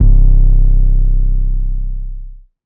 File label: 808 C; Advent Calendar - Day 9 - 808s